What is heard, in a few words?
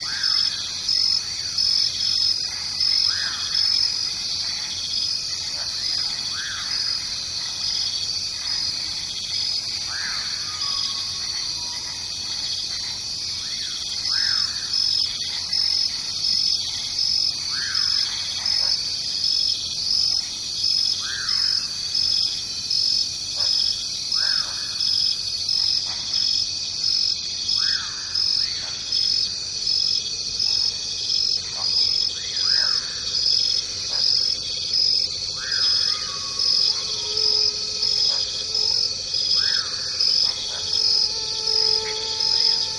nature rainforest jungle ambience birds insects frogs toads; felix blume